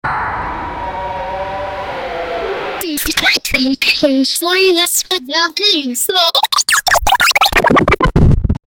a quick 16 bit flap, bird

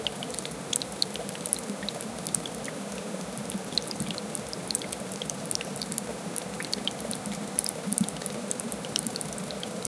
i would like a sound, cafe

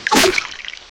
deep reptilian hiss water

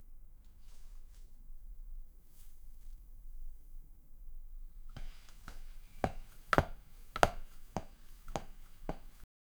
the sound of someone labo, exercise

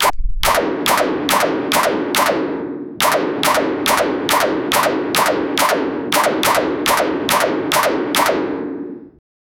machine gun for a space

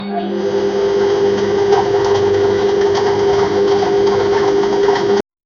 washing machine draining

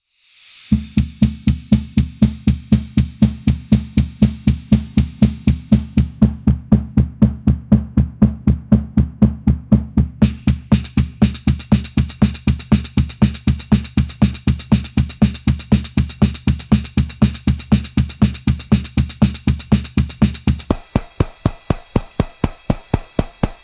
drum solo that starts off; brass

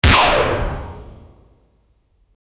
kill sound for shooter

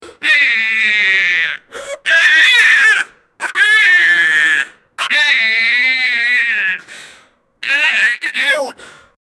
happy boy

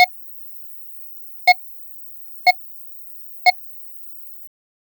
blinking light tick

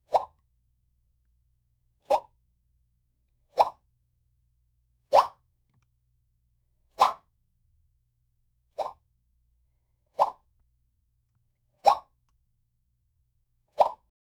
game character jump; acrobatic; SFX Engine

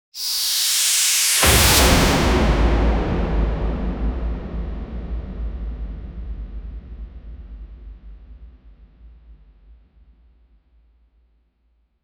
energy surge build up, arc

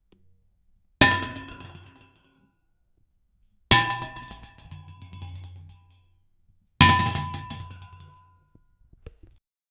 a tennis ball falls into

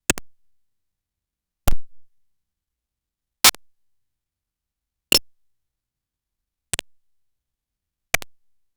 a match3 parquet piece; gameplay

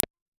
short tactile ui click ne

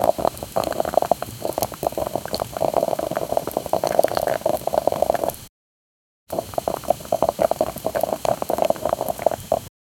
cooking with wook pan

